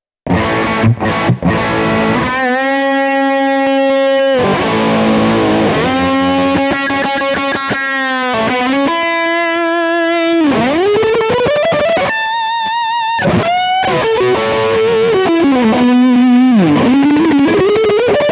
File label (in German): Fuzz Amp angezerrt; Baltic Blue